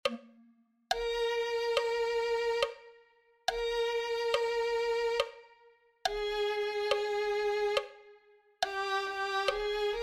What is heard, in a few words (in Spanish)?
violin2